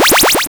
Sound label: heal; SpiderCut